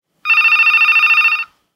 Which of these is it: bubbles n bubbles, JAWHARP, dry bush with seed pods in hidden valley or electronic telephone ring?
electronic telephone ring